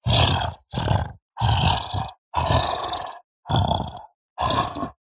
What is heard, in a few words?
horror monster playful curious caring grunts groans